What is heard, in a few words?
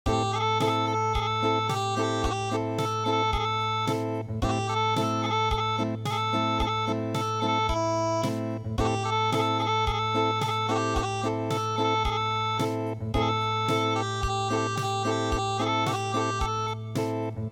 Acoustic